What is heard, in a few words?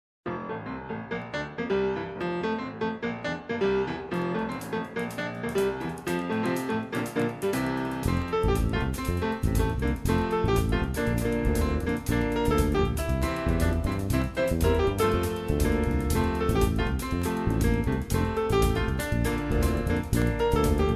Jingle Bells; whitehouse; barney